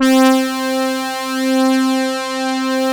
SYNHORN; Greytsounds; Scott Peers Sound Engineering

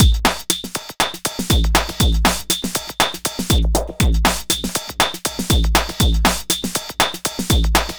xtra techno beat; Sonic Foundry (Sony Creative Software); Ugly Remnants VOL-2

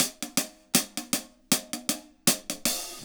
HAT, HI HAT LOOPS, Dub; PERC LOOPS; Drumdrops In Dub VOL-1

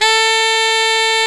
A3, REED; AKAI S-Series CD-ROM Sound Library VOL-8

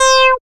Dance Synths, Acid Bubble, c

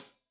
Hat Tight, Hi-Hat, Cymbals; Hat Tight 1; Stereo; Deeply Sampled Drums

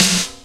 CRACKED SN, Techno Trance; E-MU Formula 4000 Series Vol. 2

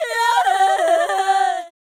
Fem Vocal 128bpm G FX, African Voices

